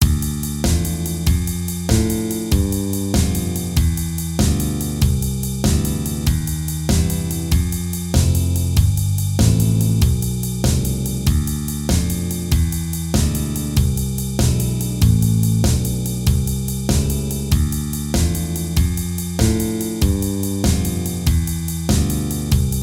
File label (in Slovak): Slowrock var3 triolykrokovy basbass